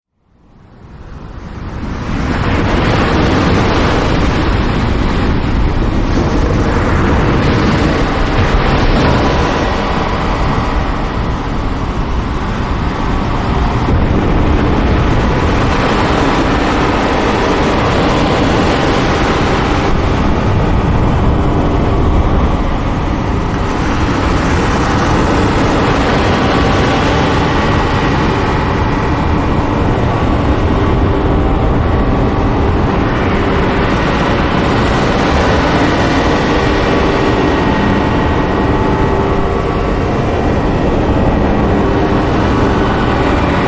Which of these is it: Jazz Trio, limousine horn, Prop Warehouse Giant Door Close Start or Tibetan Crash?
Tibetan Crash